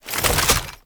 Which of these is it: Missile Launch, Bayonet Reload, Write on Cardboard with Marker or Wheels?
Bayonet Reload